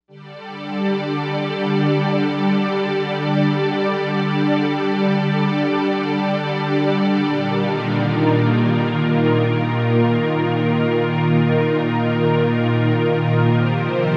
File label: Breakdown Pad, Novation UltraNova; Factory Patches